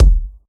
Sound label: kicks; Anchorman; RoBKTA Sample Pack Supreme